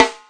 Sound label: tight real snare; single hits